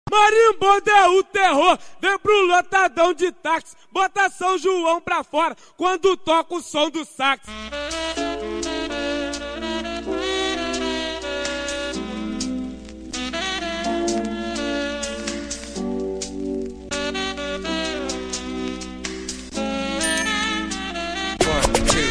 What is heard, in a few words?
Sax, Funk